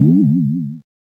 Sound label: trampoline; watertowerclassic